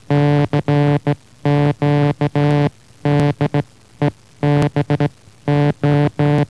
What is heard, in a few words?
spark